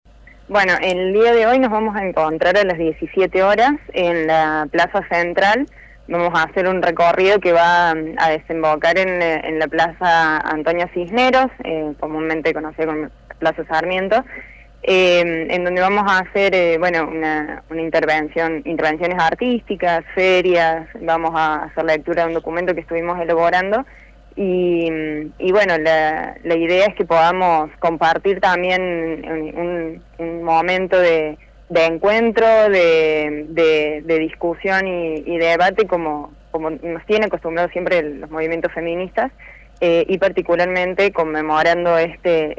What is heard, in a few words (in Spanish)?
MARCHA; movilización